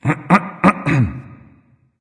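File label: nonverbal clear throat; smite; voicepack; ullr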